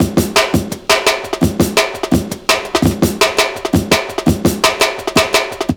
LOOP, Explosive Jungle; USB Soundscan vol.05; AKAI

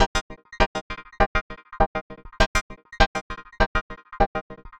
synth 100 stabs C Min; Transmission-X

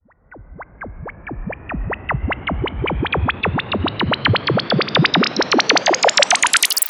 Sweeps Up, Effects

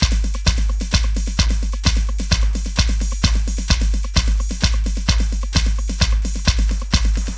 ACID, Tekkno LOOPS 130; ACID FOLKS; Masterbits - Tekkno Trax

